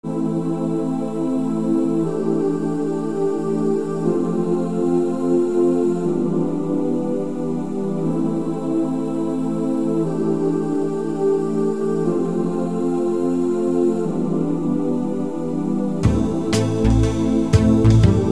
strings

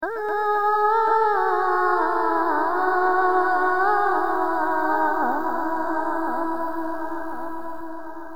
Vocal

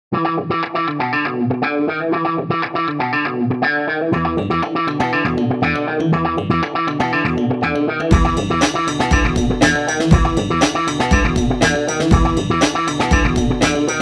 drums

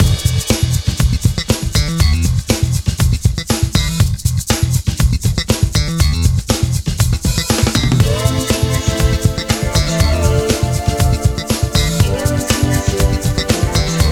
shaker

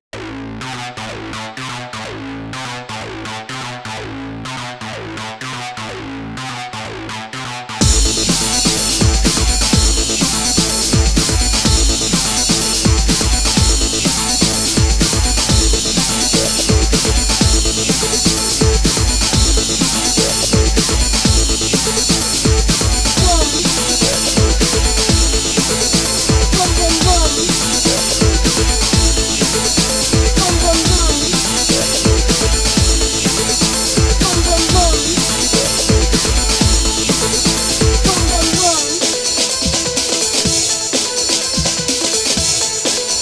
breakbeat

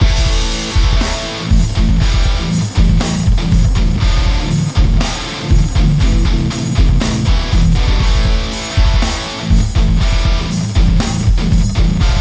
hardcore

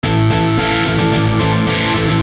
break

